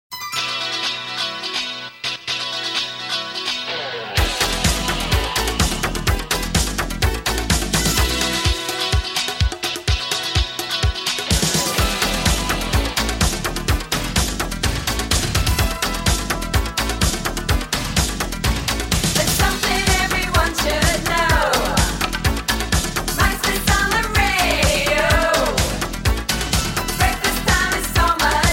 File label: Radio 1 Jingles